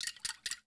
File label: amb lounge misc; Earth and Beyond; EA Games